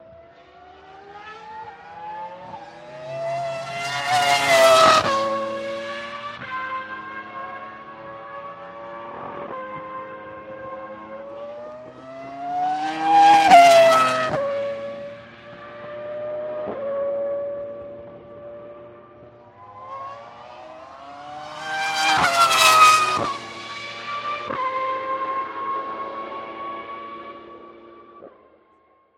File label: Formula 1 cars off bend into straight